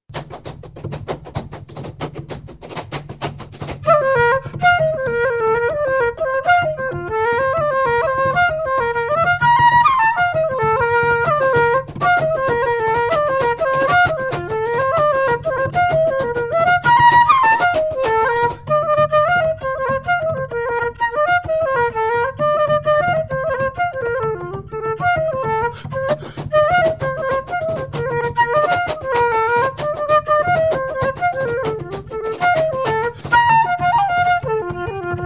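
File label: F flute bodhran hard shoe fast; goldring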